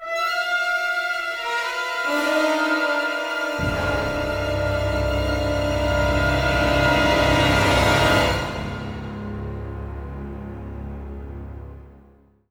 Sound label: FULL ORCA D; East West; Symphonic Adventures